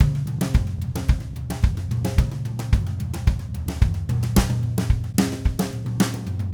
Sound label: Red Maple Groove 110, DW Red Maple Kit